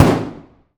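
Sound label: lmg sup urb int close rear player; atmo; gmod; viper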